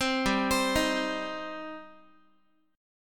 G Suspended 4th Sharp 5th G, down, Guitar; Gsus4#5 arpeggiated

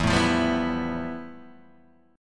F Major Sharp 11th E

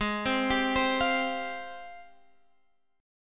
G sharp Major 13th, Guitar, Drop D; 18,15,17,17,18,x

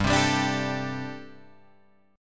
G Suspended 2nd Suspended 4th G, Guitar, Open G; chordshape; down

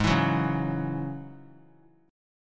G sharp 7th Suspended 4th Sharp 5th G sharp; G#7sus4#5 strummed; 20,23,21,23; JGuitar

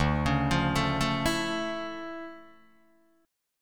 D Minor Major 9th D down Guitar Open D

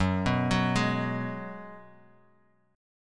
Ab Minor 7th Gb

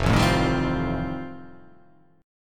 F 9th F, chord

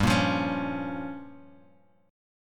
G Minor 7th Flat 5th, Bass Standard; Gm7b5 strummed; JGuitar

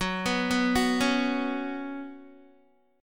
Gb Suspended 4th Gb, down, Guitar; Gbsus4 arpeggiated

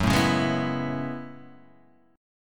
F sharp Minor 11th

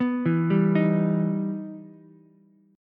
E Minor Major 7th, Ukulele; EmM7 arpeggiated

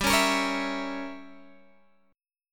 G Diminished 7th, Guitar, Open F; Gdim7 strummed

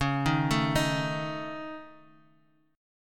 Db Suspended 2nd Sharp 5th, Guitar, Drop D

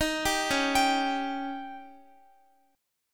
Db Suspended 2nd Flat 5th, Guitar; arpeggiated; JGuitar

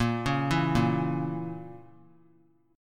A sharp Diminished, chord; arpeggiated

